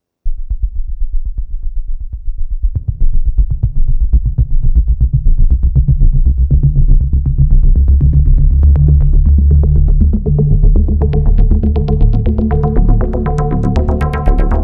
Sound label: Alesis Fusion A Bass + Arp